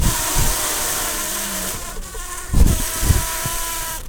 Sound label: bumblebees, Pascuorum queens